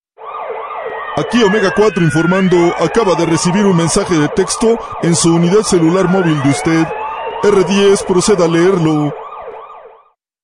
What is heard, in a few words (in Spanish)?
mensaje de texto